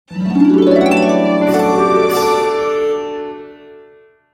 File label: Jingle Win; mata hari windows gamerip 2008